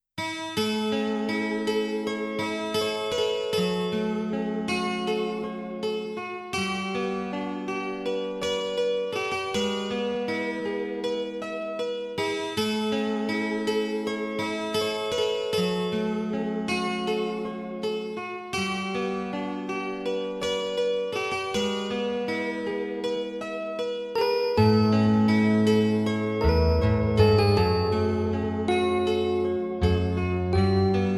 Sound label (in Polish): muzyka do masażu 432 Hz